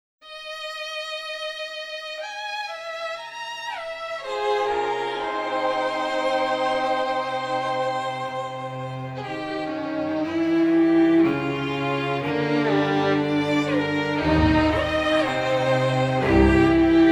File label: Impro Harmonized